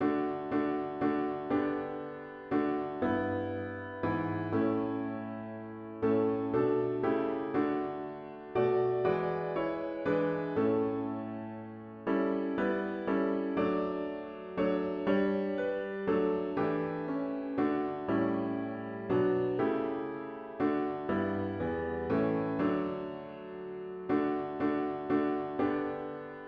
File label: piano only; O Master Let Me Walk with Thee